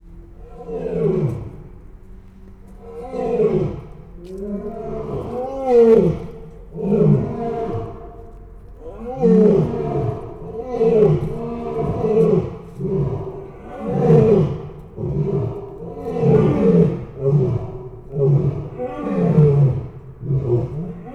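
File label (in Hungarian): távolról oroszlánok előterében foltos hiénák mozognak; szegedi zoo 2011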